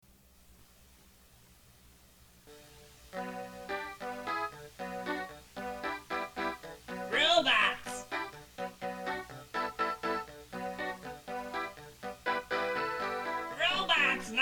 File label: robots chorus